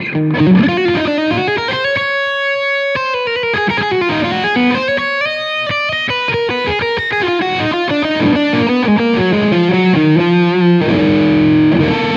Fusion Lead mic

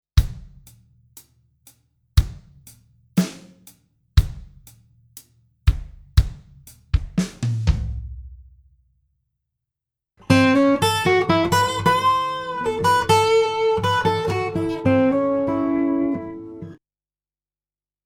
RMX16 Chorus

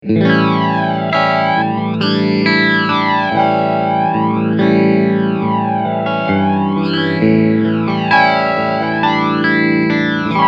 Flanger

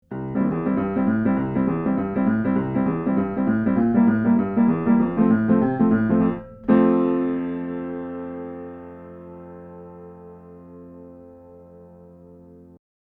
Coles 4038 Blumlein Piano

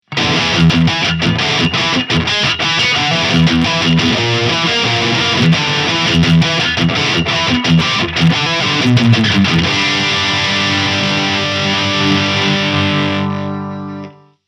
Chug High Gain; Solar Guitars

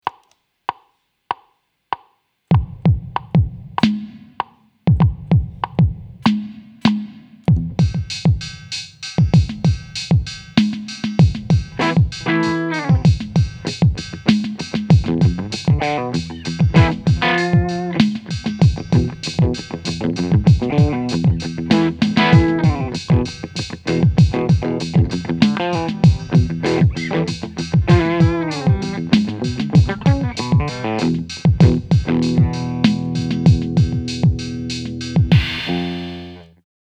AMP Session

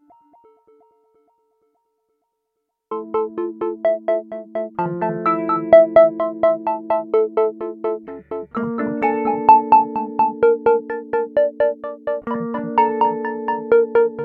ARP

